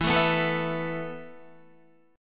E Suspended 4th E, down, Guitar, 4F,4A,5C,5G,5C,6E; Esus4 strummed